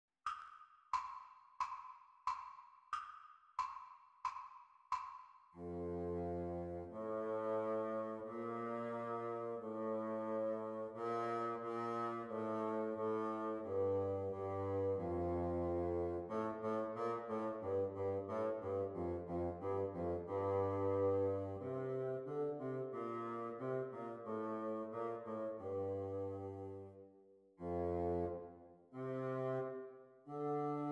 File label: Bassoon Duets exam speed